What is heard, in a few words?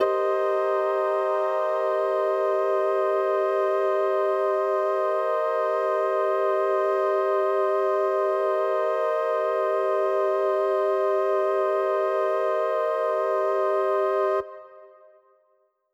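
Mixed Winds C minor 7, synth orchestra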